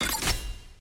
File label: ui map edit